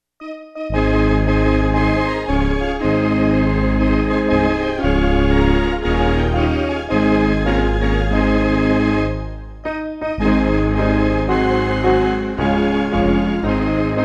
instrumental reference mix; Joy Unspeakable

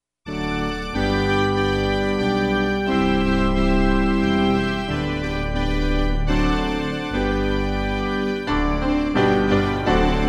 instrumental reference mix; O For A Thousand Tongues To Sing

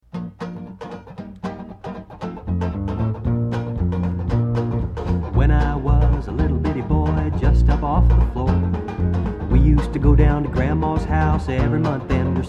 Stereo Band&Vocal Mix

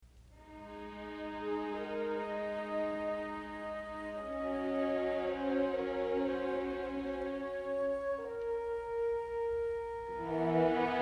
Stereo Band Track

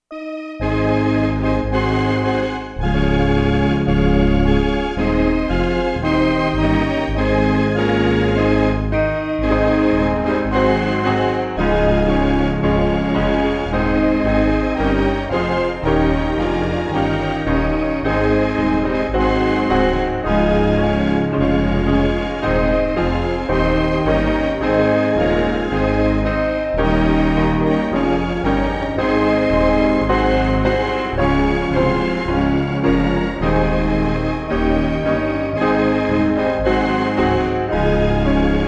instrumental reference mix; Stand Up, Stand Up For Jesus

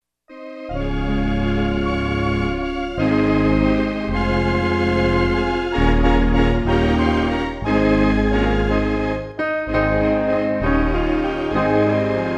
instrumental reference mix

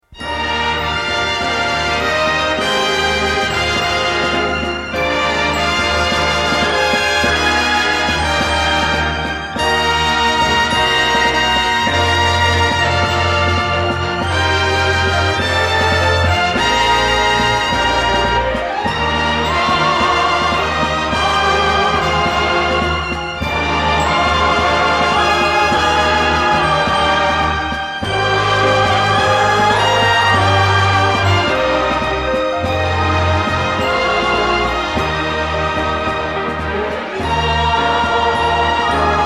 Closing Theme - Stereo Band&Vocal Mix

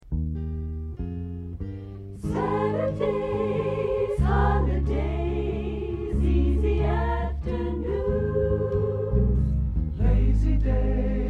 Band&Vocal Track

